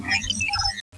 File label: Computer Beep Series; Star Trek; TNG